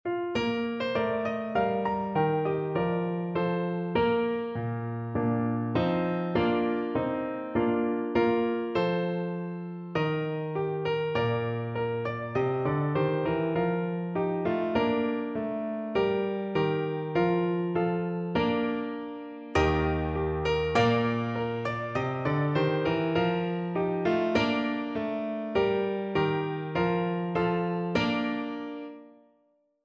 horn, French Horn

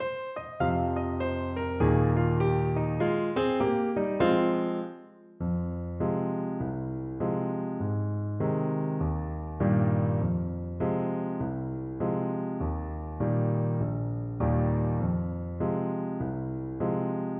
bonapart crossing the rocky mountains trumpet

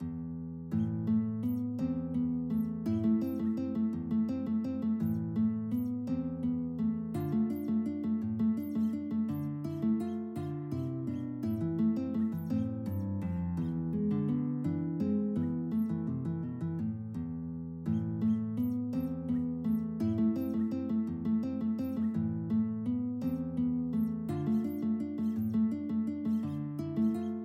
Metsakukkia (Woodland Flowers), guitar

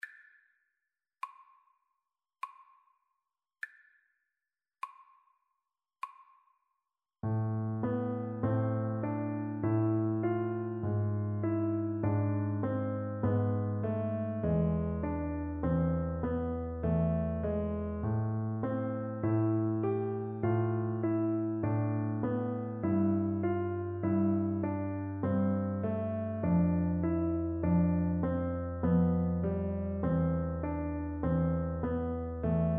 horn, French Horn

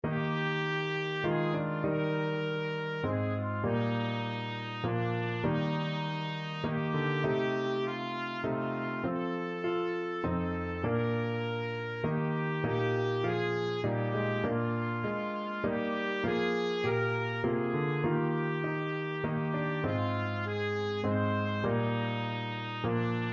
trumpet

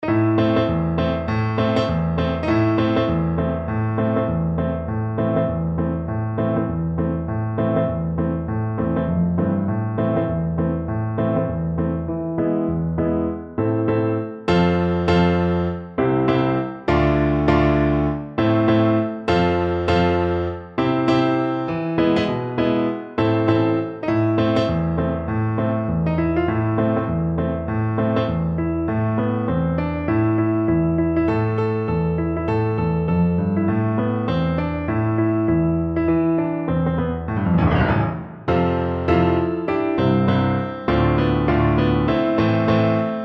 wellerman, Alto Recorder

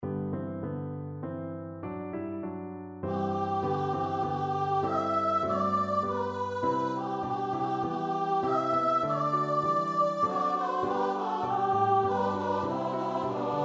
choir; holly ivy

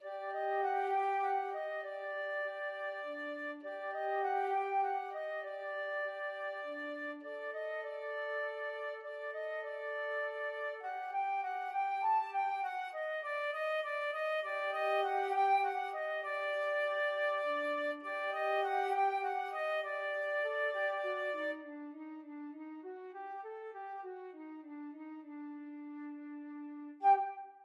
charming snake FL duet, flute duet

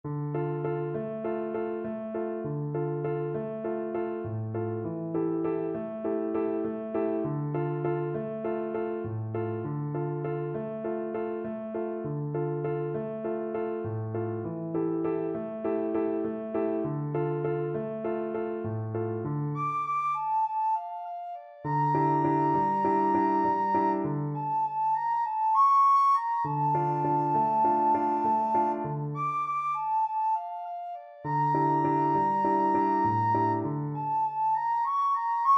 buenos aires, recorder

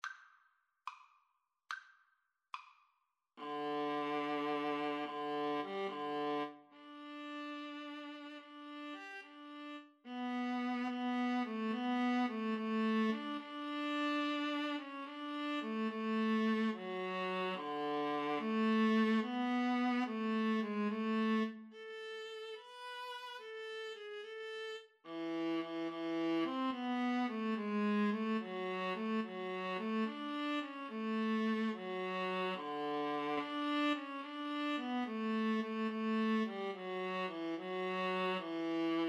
Violin-Viola Duet

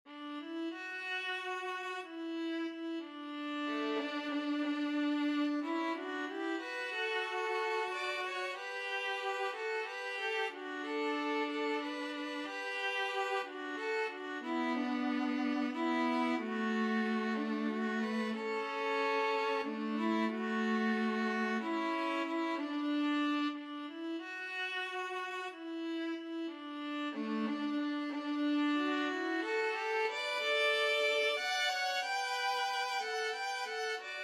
violin viola duet

